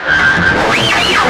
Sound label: SCRAPE, Guitar; SPECIAL EFX; East West; Steve Stevens Guitar Samples Collection